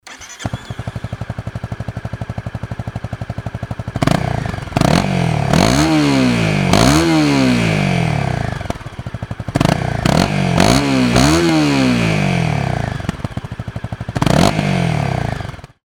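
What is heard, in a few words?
crf250rally normal, motorcycle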